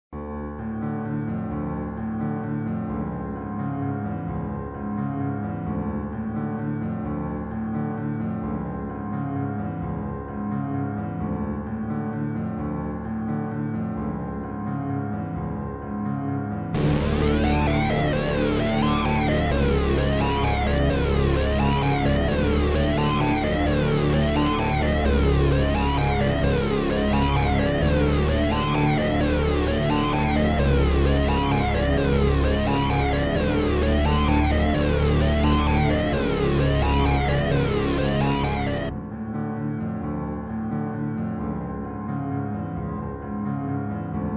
Diagonal lift music; flashing warning

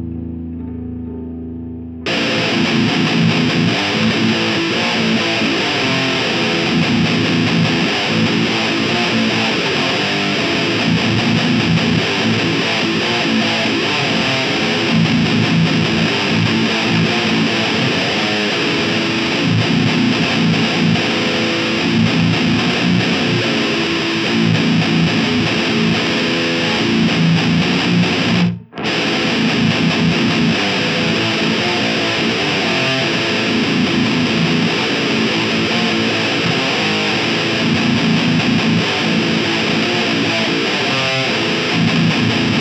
riff

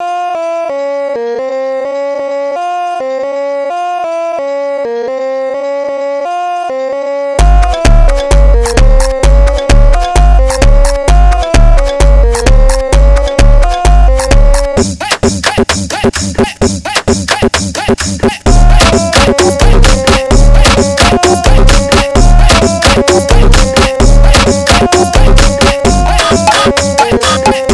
phonk

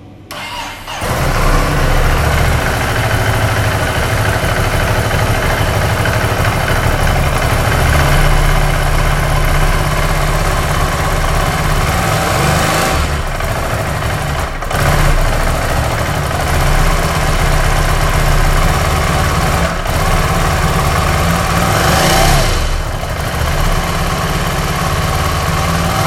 pop

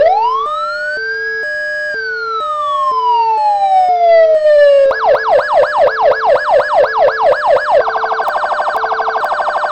siren

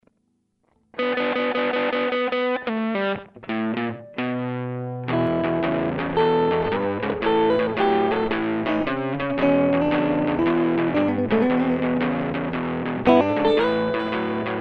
heavy blues

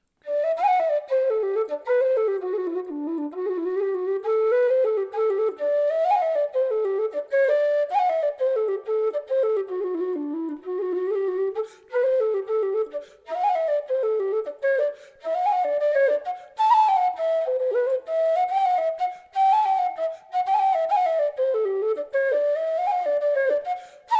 low D avec reverb; banish misfortune; anthony duncan

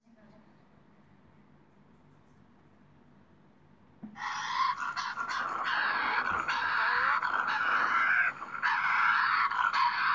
screams

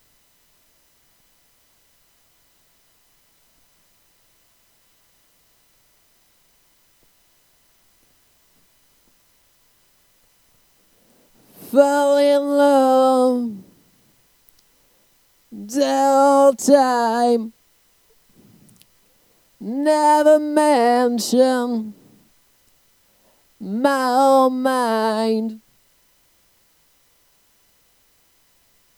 bridge echo

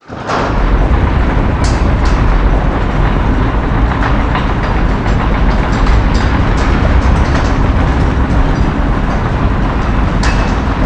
crusher start loop

